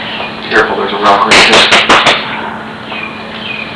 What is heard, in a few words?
clap